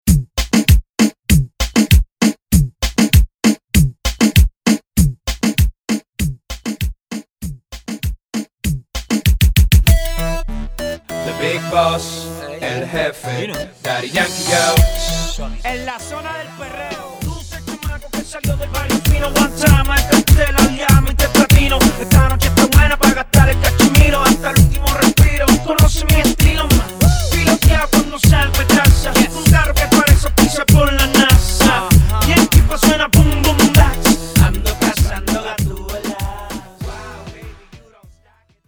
Intro Break 98BPM

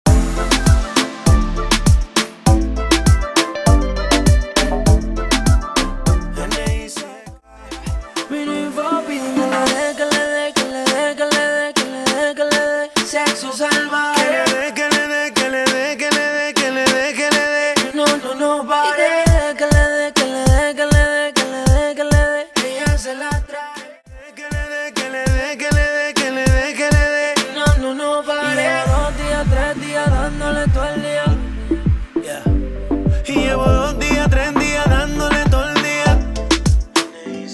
percapella intro outro 100 BPM

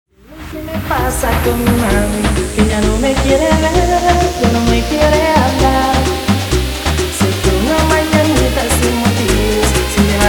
guaracha remix 130 bpm

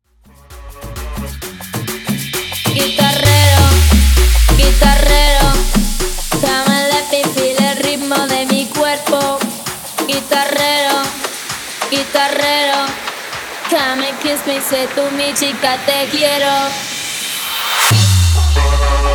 guitarrero tribal remix 130 bpm

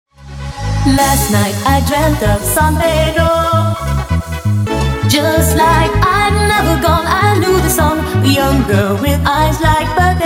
intro outro 130 bpm

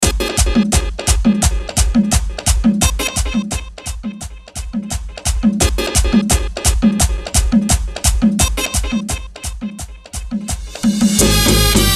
simple intro 172 BPM

